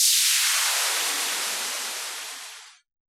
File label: hip hop kit wave